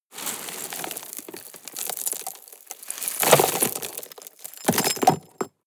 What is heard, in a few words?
drop rock debris hard